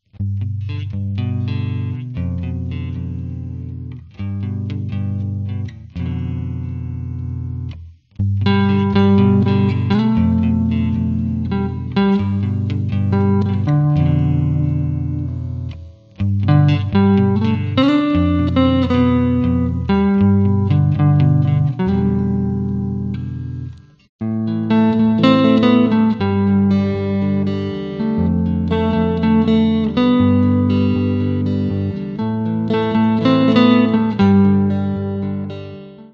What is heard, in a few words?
Songs For Guitar